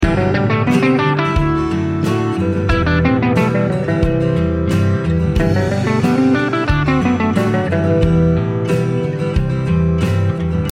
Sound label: E Minor example